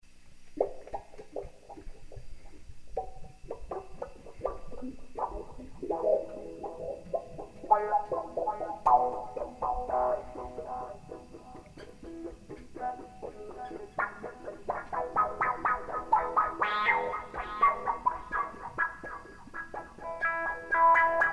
Blues

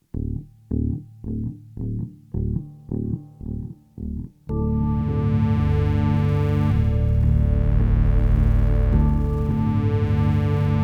bass space

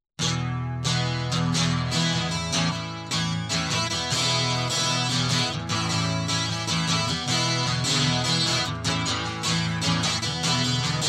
Guitar Loop

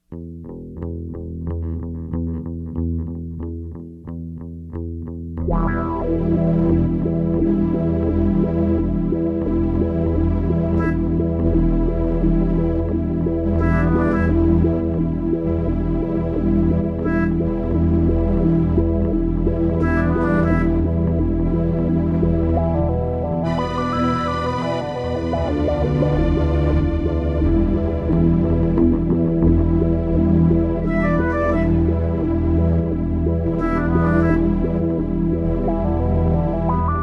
bass intro

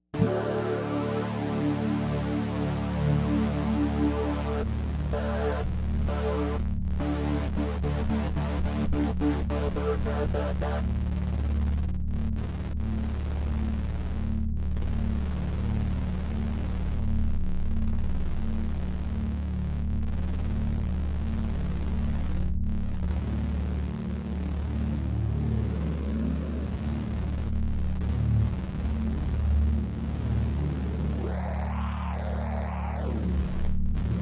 Instrumental Suite

